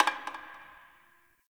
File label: Garbage Metal Can